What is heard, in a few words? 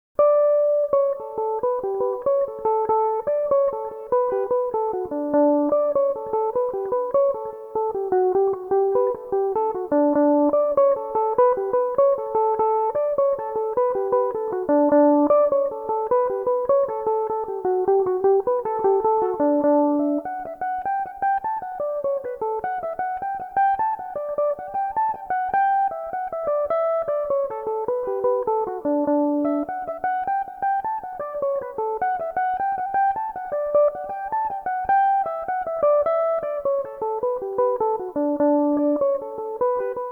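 A mixolydian, irish mandolin, tunes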